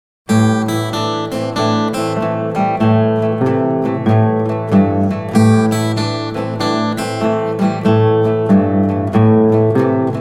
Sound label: going downhill, Gitarre; blues; ragtime